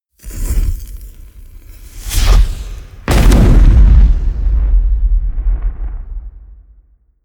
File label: spell fireball cast large; explosionen; comedy-cartoon; soundpool